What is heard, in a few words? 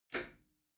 Wall Outlet Far Wall Plug In Hard